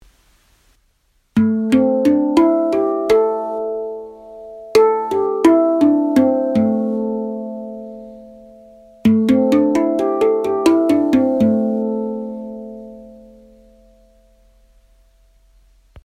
spacedrum pentatonic A 6 notes

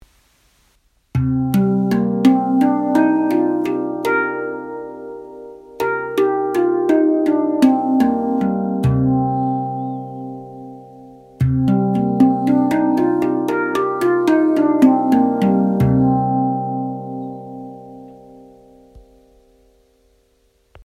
spacedrum handpan 9 notes; anaziska

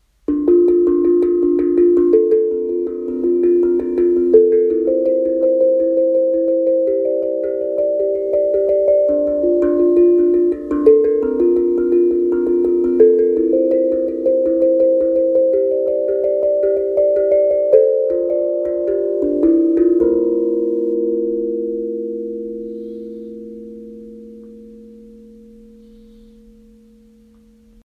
CELTIC MINOR, handpan; ZENKO

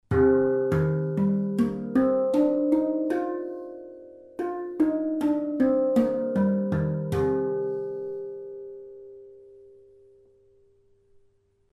HANDPAN SPACEDRUM DEEP SKY

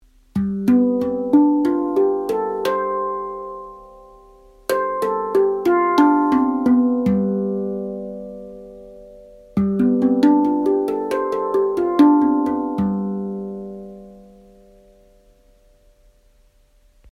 spacedrum handpan pantam ragadesh

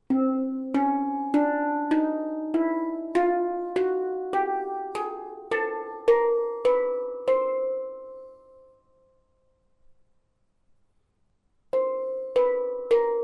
handpan chromatic

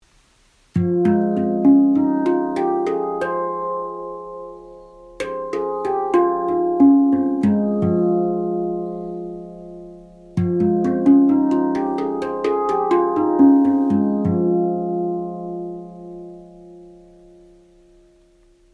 handpan spacedrum equinox

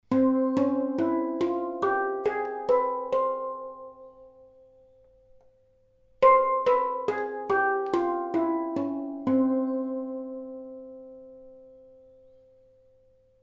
handpan hitzazkar 8 notes

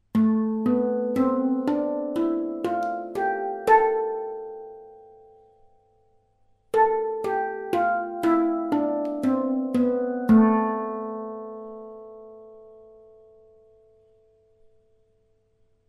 handpan spacedrum diatonique la minor

